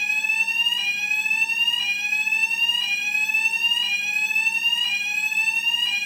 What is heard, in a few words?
alarm; otclientv8